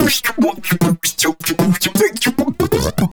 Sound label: Beat Box, Hip-Hop and RnB Vocals